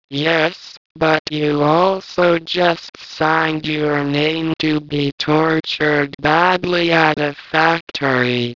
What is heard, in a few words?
raspy tortured